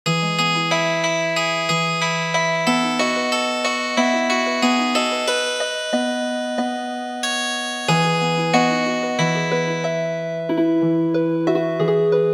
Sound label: choo choo train Healing Harp